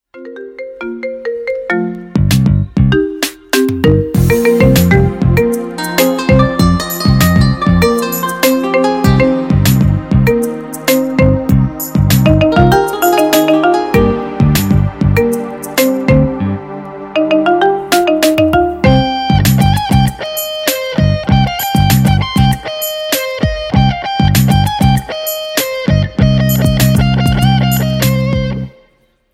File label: Marimba